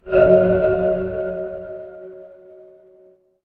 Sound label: magic scifi alien